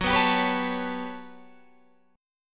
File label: G Major Sharp 11th Gb, chord